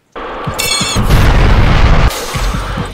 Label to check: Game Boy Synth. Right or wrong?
Wrong